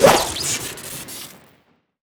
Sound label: sword swing crit; tf2classic